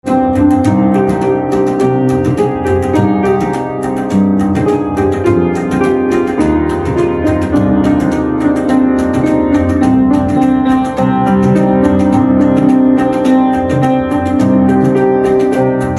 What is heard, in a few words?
Rubab Instrumental Ringtone